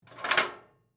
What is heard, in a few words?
Medical Scale, Emergency, Medical, Scale, Move, Weight; Hospitals